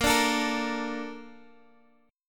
A sharp Major 7th, Guitar Standard; x,13,12,14,11,x; A#M7 strummed; capo=3

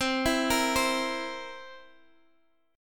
C 7th C down, C7; arpeggiated

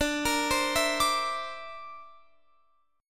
D 7th Suspended 2nd Sharp 5th, Guitar Open F; arpeggiated; x,21,22,19,19,21; chord

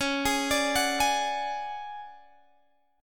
D Major 7th Flat 5th Db, chord

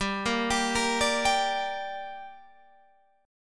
G Minor G down Guitar Open G, chord

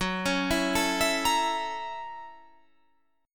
F sharp 7th Sharp 9th

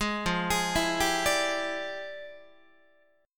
F Minor Major 13th, Guitar; arpeggiated; JGuitar

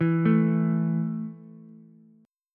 A 5th A down Ukulele, A5 Chord; arpeggiated; JGuitar

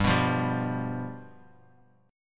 G 7th G down

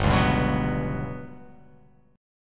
G Major 7th Flat 5th

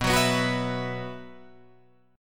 B Suspended 4th, Guitar, Drop D

